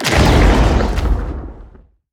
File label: dragons step; sfx; tyranthor